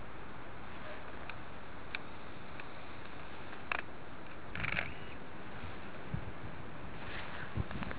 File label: outside by large tree